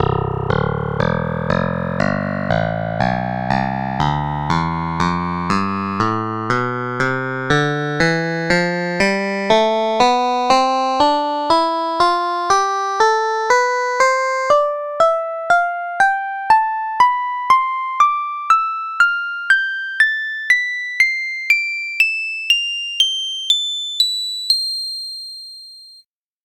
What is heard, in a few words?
clavinet tonleiter; SpectMorph Evaluation #4